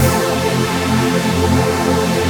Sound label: SAW